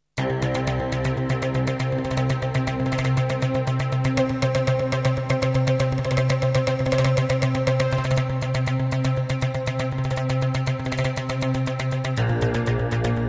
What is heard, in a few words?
jingles; 90 DDD Jingles